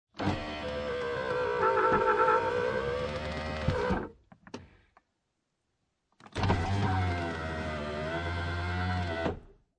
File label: Transportation Automobiles, Car Interior, 1996 Acura Integra, Window, Automatic, Up Than Down, No Engine